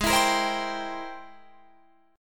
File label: G sharp Augmented Major 9th G sharp Guitar Open D